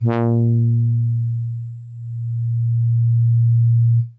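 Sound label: Soft Horns DX100 A#2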